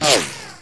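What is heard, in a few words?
bullet left to right, near miss; hl2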